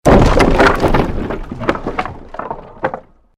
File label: created wooden structure crash medium